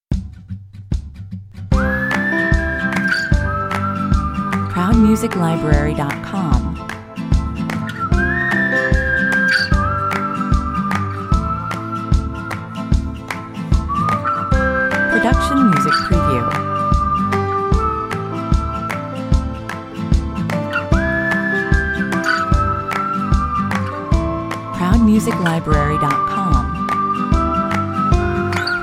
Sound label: the whistle song